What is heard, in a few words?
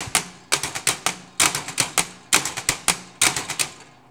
TESLA, Science Fiction